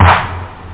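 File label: snare drum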